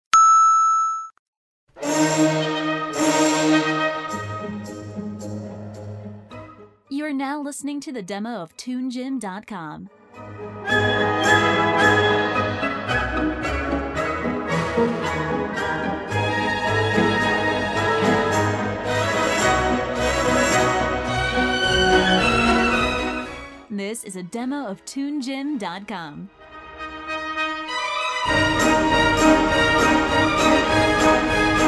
Waltz

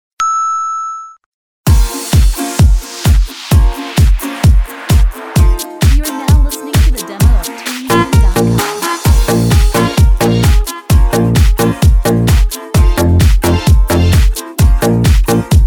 Tango

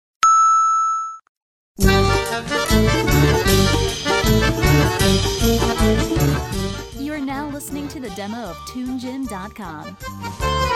Quadrille; Tunegym

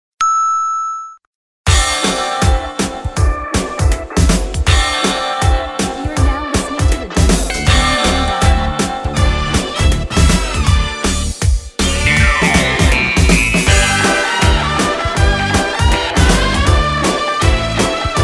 Rock

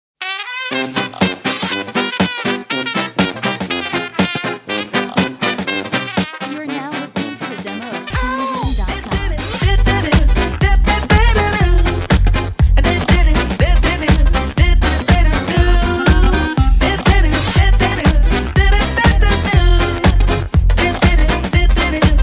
Swing

